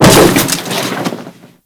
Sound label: Grineer Body Roll; Warframe